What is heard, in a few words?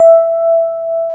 PIA DX E-PIANOS